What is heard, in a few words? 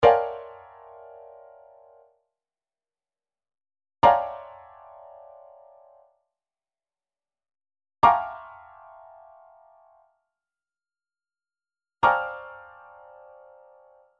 chord lines Bb, midi; Midi Files 1000